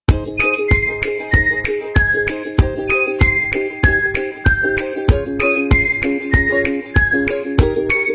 Música de Fondo Divertida para Videos Música Alegre Instrumental

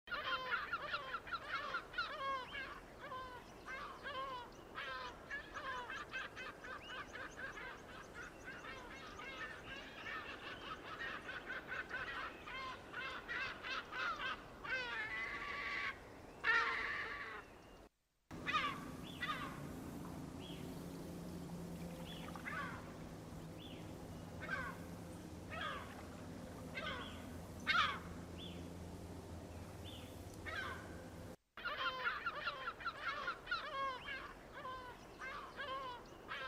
sonido gaviota

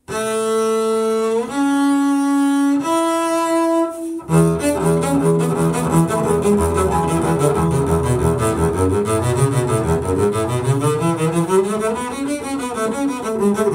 Concierto para Contrabajo y Orquesta en La Mayor

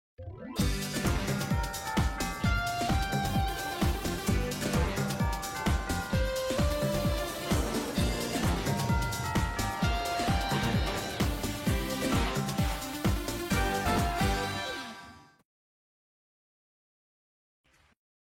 sintonia principal

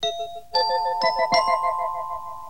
Campanitas

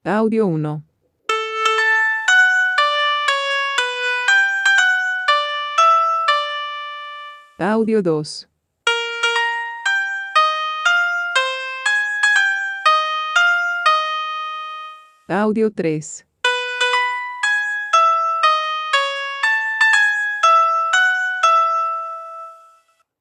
tonos

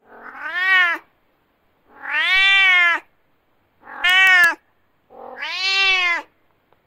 Gato

